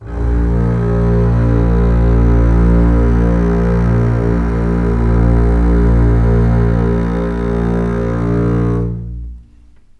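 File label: A#1 legato, String; East West; The Ultimate String Collection; COMBO 6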